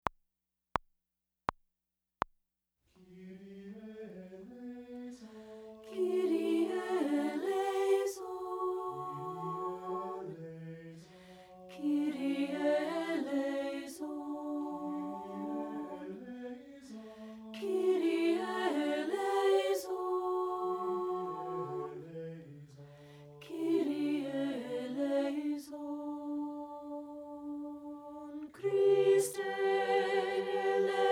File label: ANTIPHONAL KYRIE ALTO